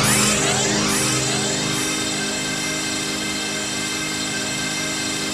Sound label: ATMOPAD; Best Service; XX Large; Pads